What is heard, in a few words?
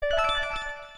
Xiaomi Crystal